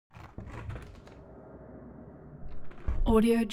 object window open flight flyby close soft

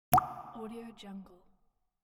water drop

